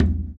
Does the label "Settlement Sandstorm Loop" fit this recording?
No